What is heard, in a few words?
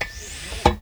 mortar load; WAR